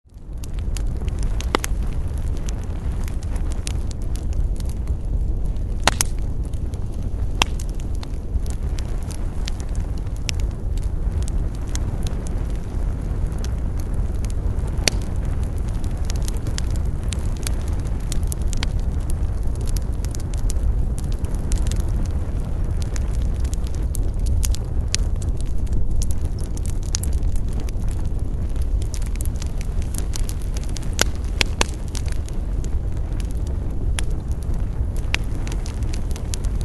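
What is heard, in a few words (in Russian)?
zvuki kostra, campfire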